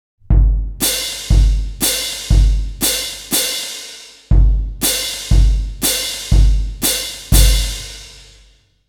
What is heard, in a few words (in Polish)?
bęben wielki talerze